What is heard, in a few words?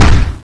step, scorpion